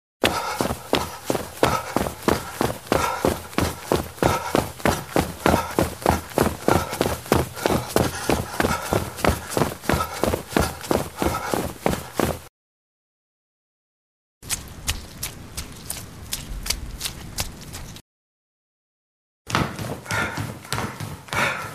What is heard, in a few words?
Breathing & Running